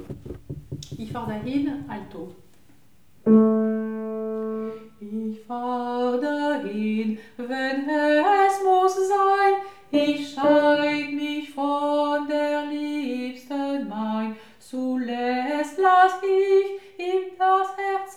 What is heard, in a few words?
Alto; Ich fahr dahin